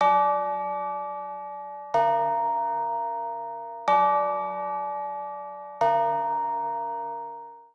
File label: bells; schattenlander